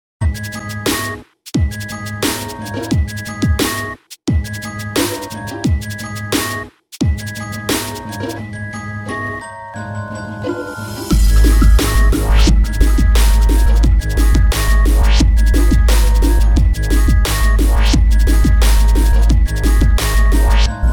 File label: Dubstep Remix DnB Mix; Schelkunchik